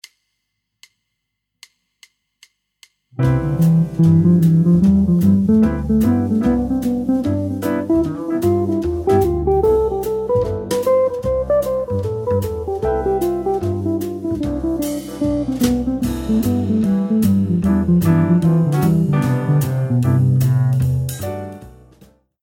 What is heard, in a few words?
jazz guitar scales